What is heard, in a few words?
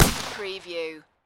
Shot Gun Mossburg 500 12 Gauge Single Shot Designed; Gun sound effects; gun shots; Gun sound effects, bullets, gun shots, pistol sounds, rifles, machine gun sounds